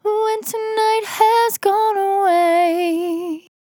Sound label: On A Star 65bpm F, Vocal; Zero-G